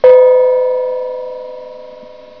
new mail

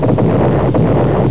BOMB HIT

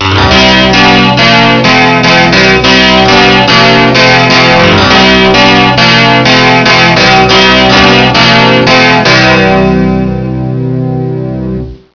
SYN RIFF

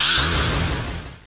explode

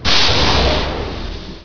DOOR

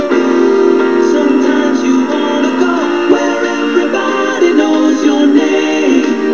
CHEERS

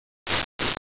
SNIFF

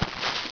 bite

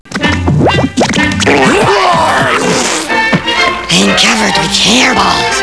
HAIRBALS

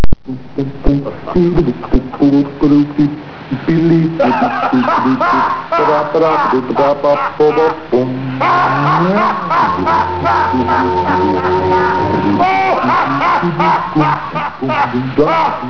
LAGH

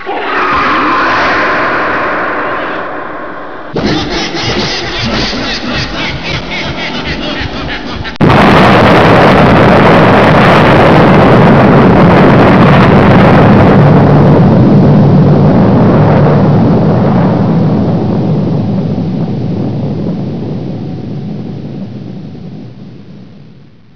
die

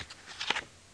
pull

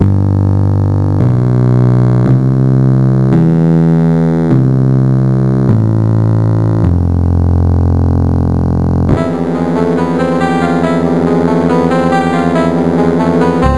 menu

